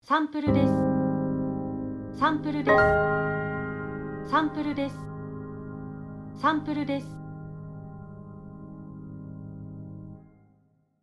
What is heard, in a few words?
Logo Piano